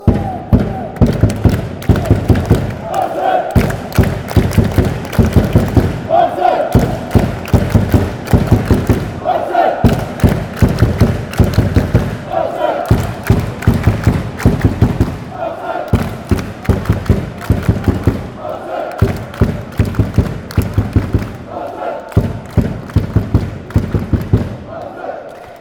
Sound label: drums fanchants; aalesunds